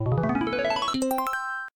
right answer effect

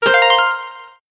click multimedia

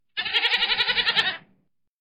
goat

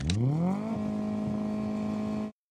single key hit car engine up rave

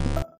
wrong answer

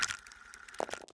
roll dice

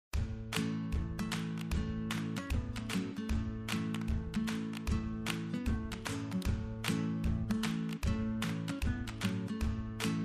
music cheerful; bgm

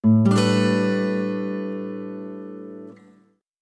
guitar

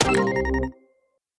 game tie

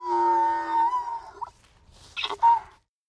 donkey foal

